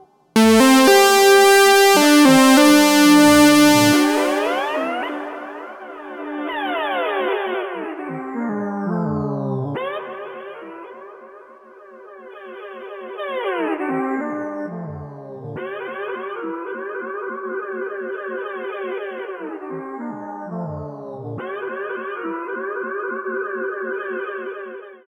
Strymon Magneto Tape Control w Step Sequencer

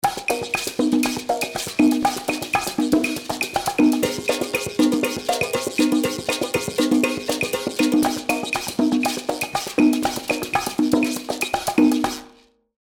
percussion dry; DRP-20

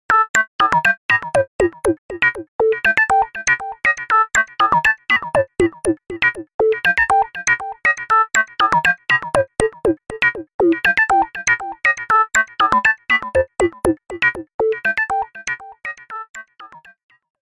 fx odd

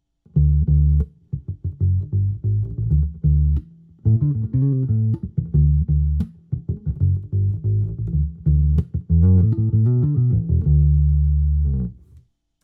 Vintage Speaker

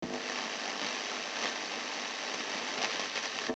Noise RX 9 Dynamic

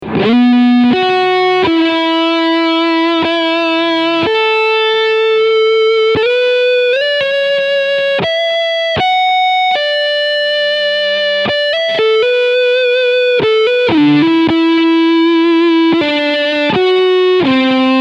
Sustain Front

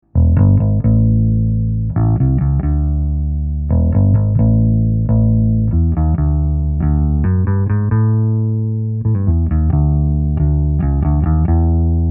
414XLS EBass Cardioid Neve1073

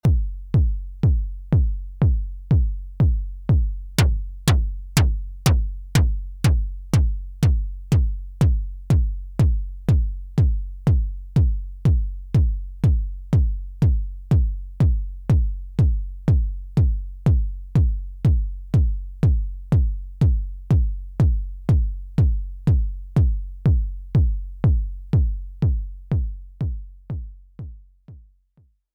Kick Prophet Kick Noise; How to Techno

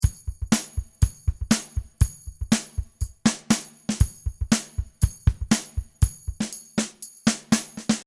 Moto Groove Dry

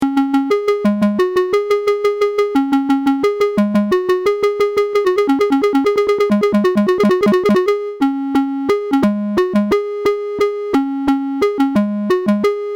FX Delay

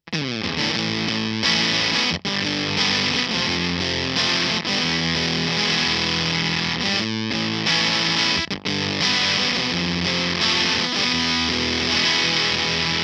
Strat Twisted

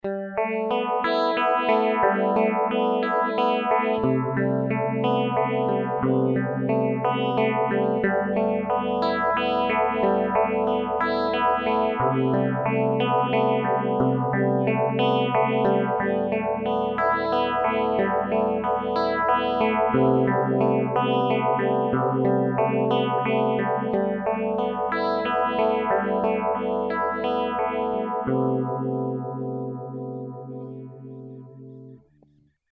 Fender Highway Phaser

